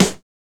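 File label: house snare drum; E-Lab; X Static Goldmine 1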